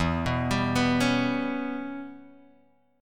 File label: E Minor 6th Guitar Open F; Em6 arpeggiated; 4,6,7,6,4,x; JGuitar